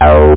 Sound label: acid